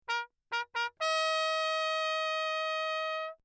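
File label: trumpet mute Lechner signal; Crown; Perinétventiltrompete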